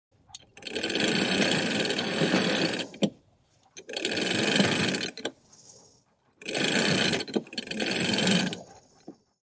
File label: Ship exterior steel winch heavy creaking; boat